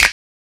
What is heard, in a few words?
Snap